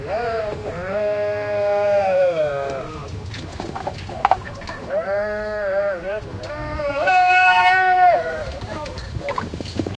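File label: cows mooing market chatter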